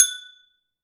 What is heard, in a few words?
test failed; VsDingExtension